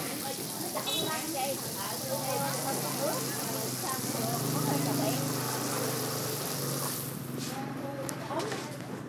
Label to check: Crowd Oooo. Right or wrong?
Wrong